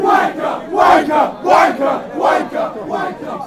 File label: wnker, fan chants; wimbledon